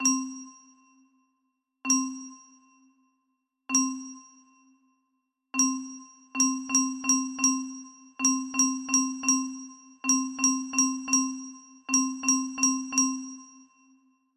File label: snare